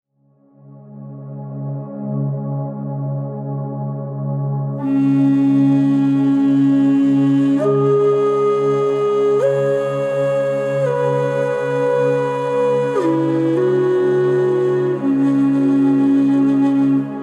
Healing Native Flute

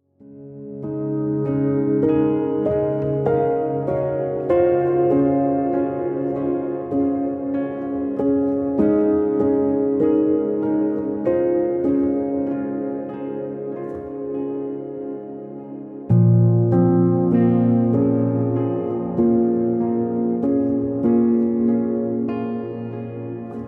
Deep Meditation Piano

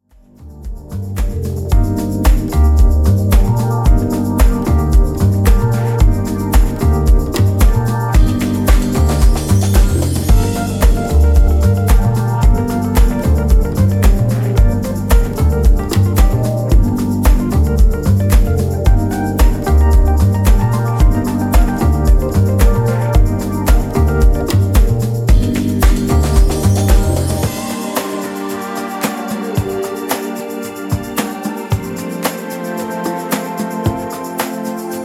Cool Groove Urban Vibes

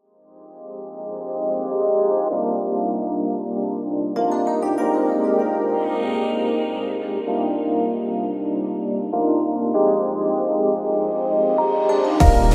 Lounge

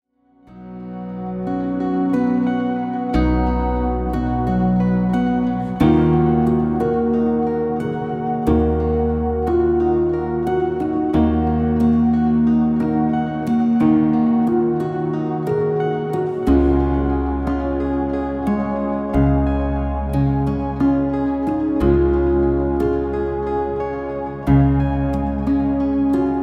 Deep Relaxing

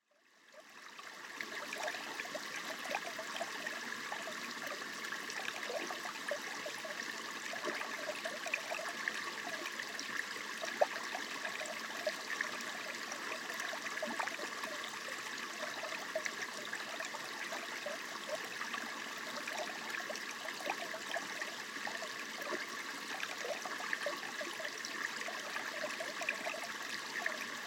Small Creek 3D

